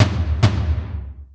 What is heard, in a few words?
catapult; 帝国时代之罗马复兴